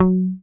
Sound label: HOFNER MUTE, Bass